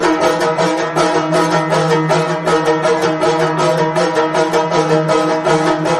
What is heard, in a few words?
banjo; music